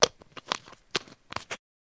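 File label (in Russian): неприятный затяжной звук открытия бутылки и чавканья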